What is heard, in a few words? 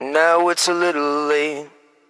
"now it's a little late" SUNG